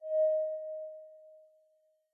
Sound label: air